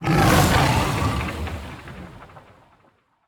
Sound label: Victory